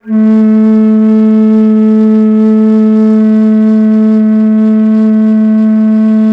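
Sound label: bass bansuri, World Winds; E-MU Producer Series Vol. 7 – Old World Instruments; World Combos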